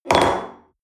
espresso upset table medium, restaurant cafe

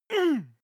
male moan pain short soft; koerpergeraeusche; menschen; soundpool

